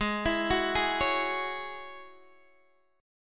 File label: Ab 7th Suspended 4th Sharp 5th down Guitar DADGAD